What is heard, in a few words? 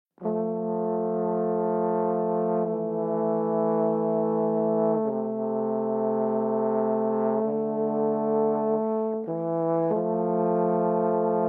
Horn